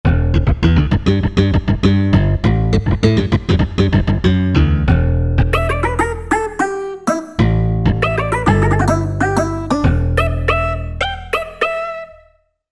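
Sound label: Slap Acoustic Bass, Korg MSC-8S Percussion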